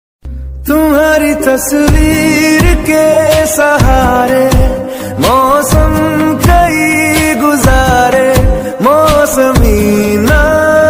trending music ringtone hindi ringtone mobile ringtones; tik tok